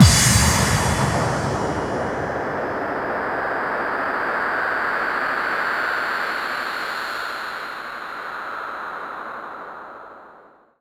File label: BD-FX, Dance Mega Drums; Best Service